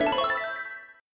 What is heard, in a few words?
right answer effect